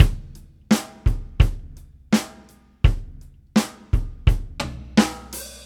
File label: Country 86bpm, Rock, Drums